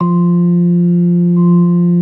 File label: B3 F 4; Best Service; Hyper Dance